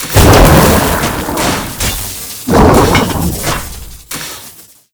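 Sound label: boss death, monster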